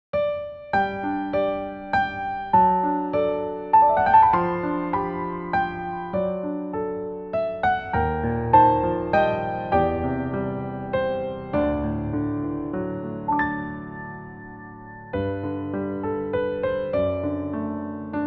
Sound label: piano; Romanos 8